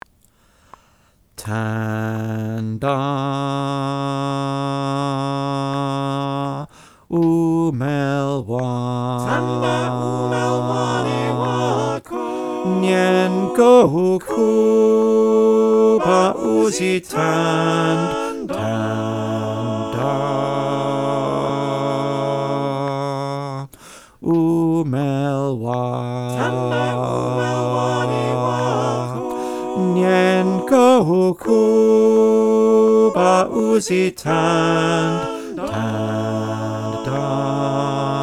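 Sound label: bass, choir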